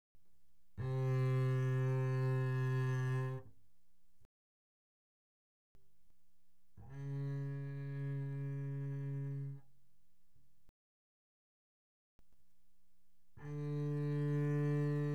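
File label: Bass arco mezzo forte sul D C3 B3, doublebass; Strings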